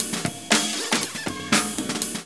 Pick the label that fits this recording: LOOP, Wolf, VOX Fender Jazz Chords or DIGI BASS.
LOOP